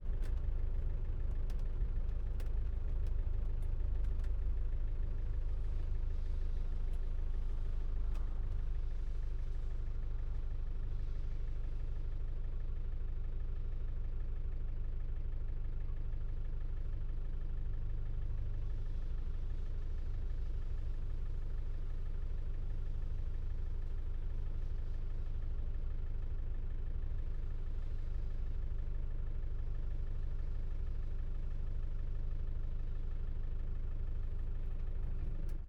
Interior traffic light stop urban rain, Land Rover Defender TDI 300; Ivo Vicic